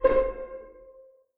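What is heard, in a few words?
string pizzicato C4 pianissimo right; Sound On Sound - Peter Siedleczek's Orchestra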